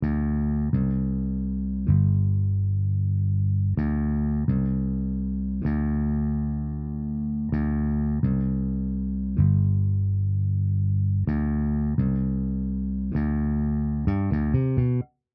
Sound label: fender bass; 128BPM